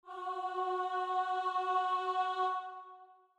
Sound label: starting note, voice; make it loud